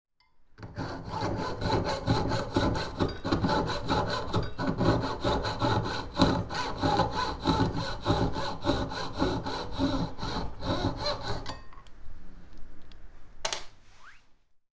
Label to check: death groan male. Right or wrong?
Wrong